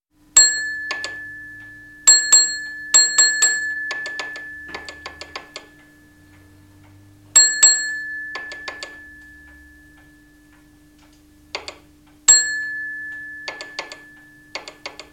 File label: trains